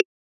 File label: message sent instant; stereo